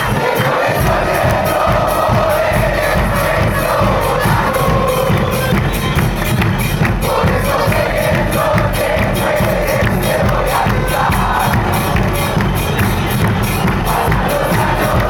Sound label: chant, santa fe